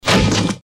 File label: destruction body impact